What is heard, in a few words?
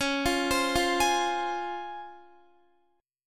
C sharp Major 7th C sharp, Guitar; arpeggiated